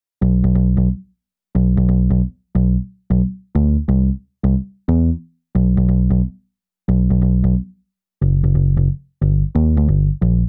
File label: Acoustic; Fresh; Bass Dragon Expansion Pack